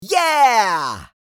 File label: Male yeah reaction